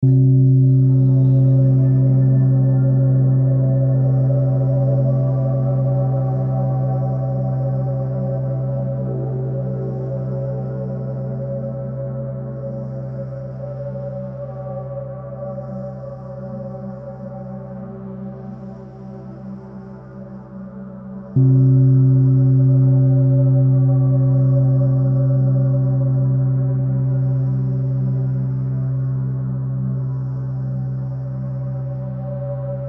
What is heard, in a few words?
Gong Foci with Space Soundscape; Meditation Helper; Meditation Boosters; crystalbundle